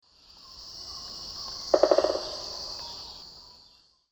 Campephilus melanoleucos, drum; S. America; Eastern Lowlands

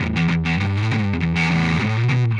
Pushing Riff, Rock Guitars

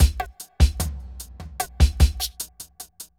evil beats 75

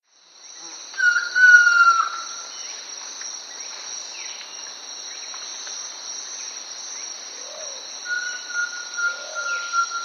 Ochre-Striped Antpitta, S. America

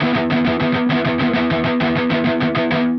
Riffing In Five, Rock, Guitars

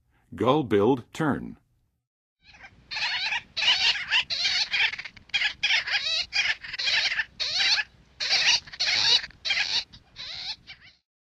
Gull-billed Tern, Songs of Birds of North America; Western USA; Western USA Songbirds

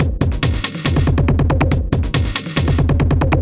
ind 140 kit

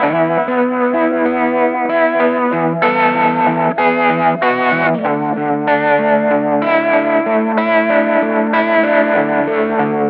ROCK POP GUITAR

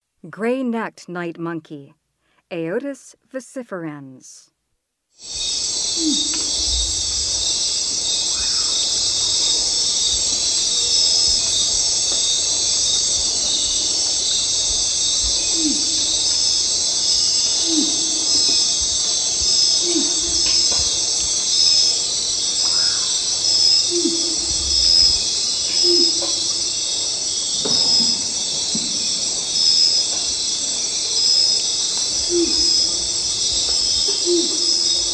Gray-necked night monkey; S. America; Rainforest

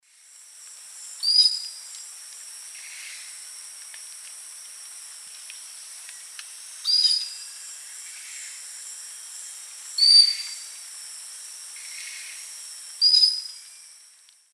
Leucopternis melanops, flight calls; S. America; Eastern Lowlands

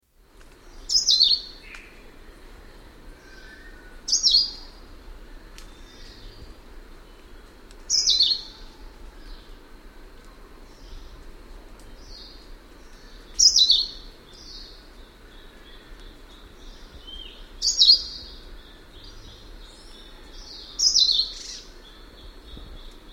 Lesser Greenlet; S. America

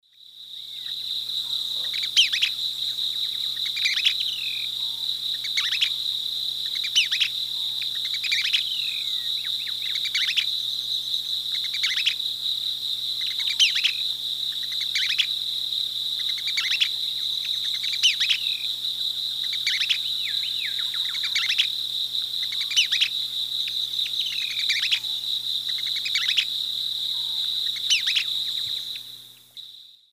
Phaeomyias murina, dawn song; S. America; Eastern Lowlands

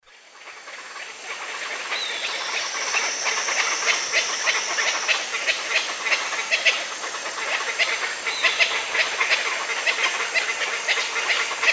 Ortalis guttata, group alarm calls; S. America; Eastern Lowlands

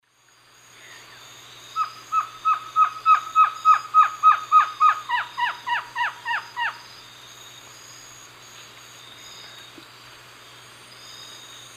Trogon viridis, song, Eastern Lowlands; S. America

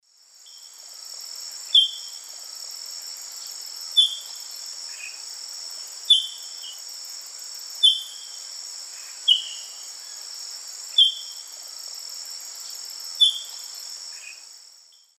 Gymnomystax mexicanus, calls; S. America; Eastern Lowlands

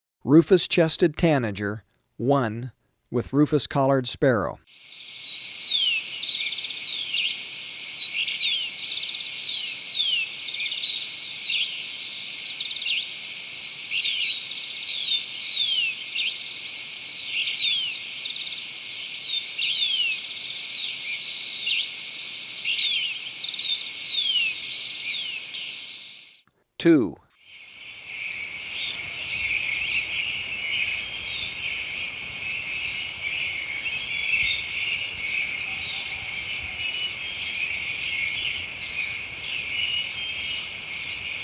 Rufous-Chested Tanager, Birds of Ecuador; S. America

